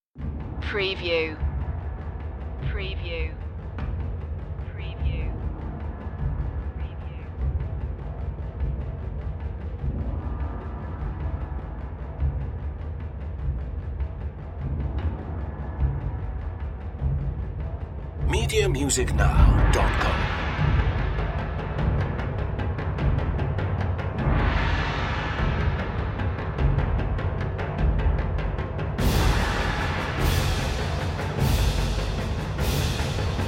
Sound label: perc